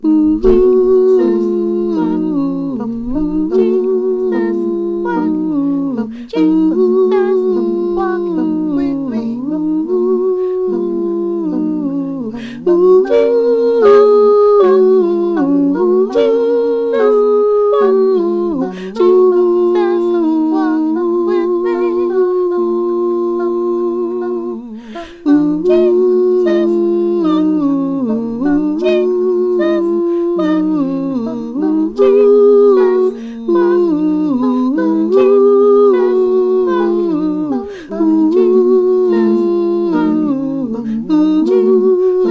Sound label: ALTO, mass choir